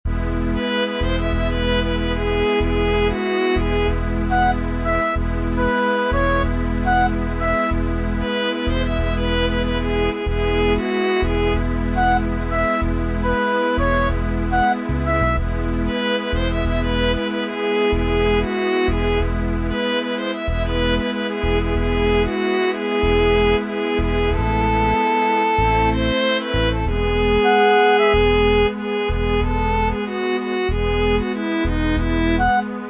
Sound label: Violin